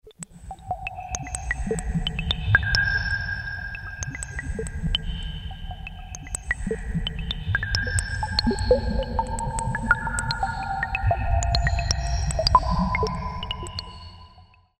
Deep Cave

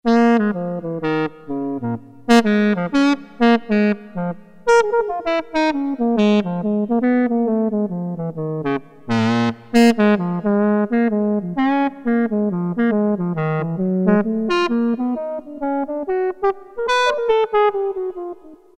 Sax; Factory A

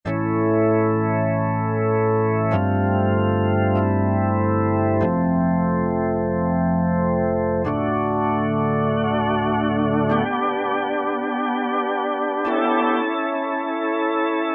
Rock Organ, Korg MSC-6S; Fretted Insts 1

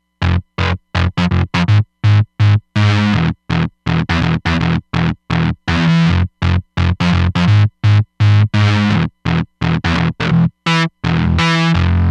Down & Dirty, Roland Fantom XR

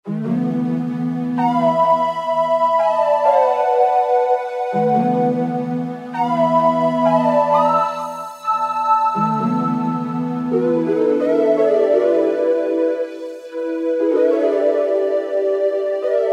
JP6 Bend 5th, Vintage Synth; Roland SR-JV80-04

